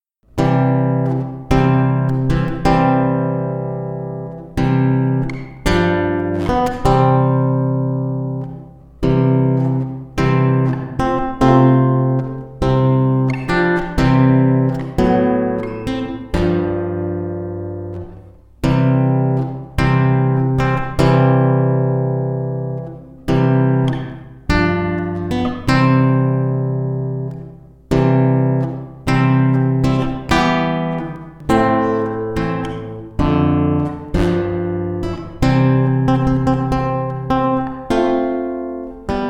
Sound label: Bridal Chorus